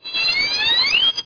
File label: viol us; 11,025 sample rate